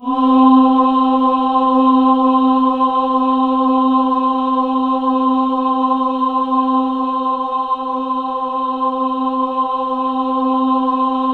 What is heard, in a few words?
C4, Synth Leads